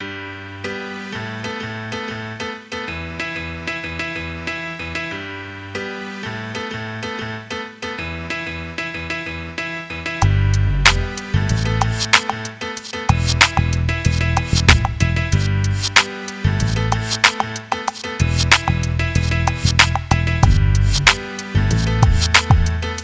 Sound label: funk